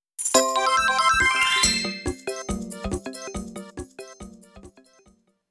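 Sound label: Achievement Fanfare; Wii Fit Plus platinum soundtrack 2011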